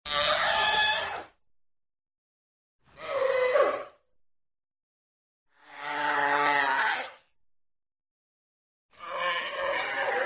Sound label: SEA LION BARKS SCREAMS SNARLS GRUNTS; wildlife sounds